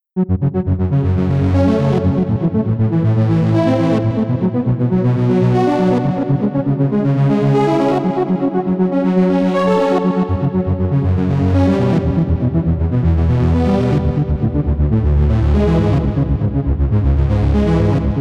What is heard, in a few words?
detuned arpeggio; blue level